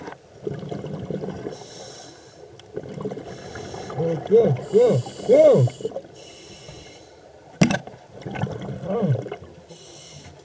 rhone sub aquatique; arles

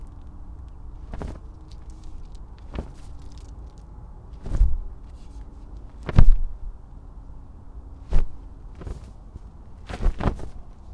BRUIT voiles claquent

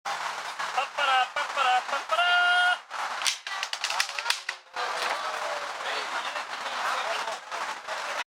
Joute cassee

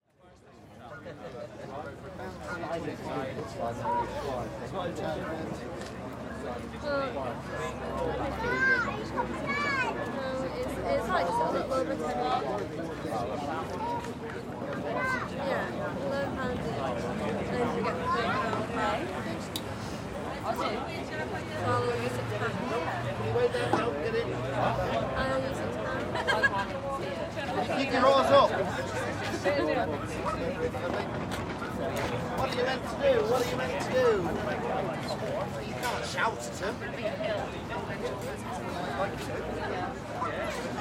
AMB touristes devant paquebot; ACTIVITES FLUVIALES ET NAVIGATIONS; Ambiance

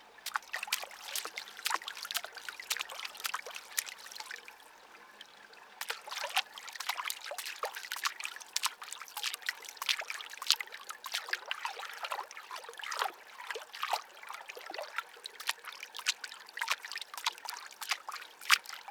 BRUIT Eau baton

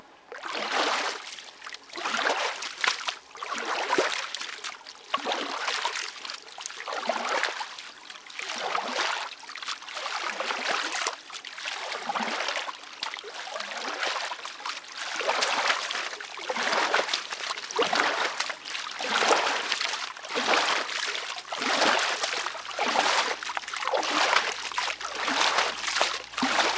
BRUIT Rame

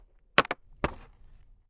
BRUIT joute

